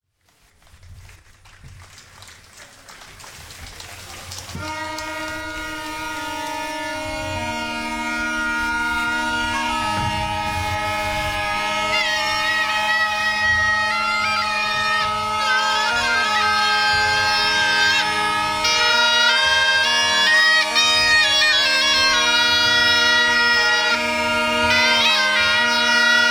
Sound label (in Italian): zampogna e ciaramella; Valle del Sole